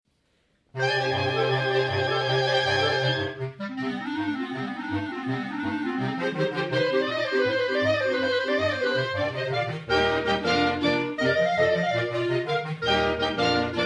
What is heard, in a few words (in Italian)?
Concerto coro clarinetti; Ensemble Il Fischio; Concerto Saggio Natale Coro Clarinetti 2012